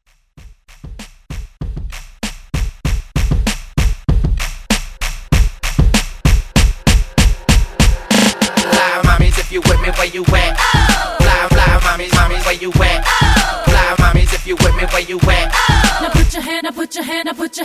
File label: Throwback Party Break